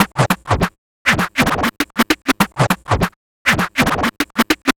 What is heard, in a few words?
Vinyl Scratch Snare; Digidesign; Pro Tools; Pro Tools Essential Loops; Dance DJ